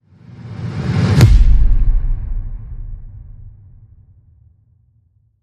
Punching Power Boom

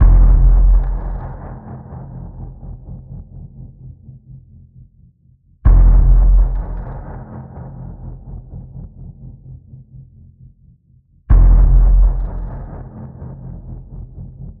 Danger Pulse F, Key of F

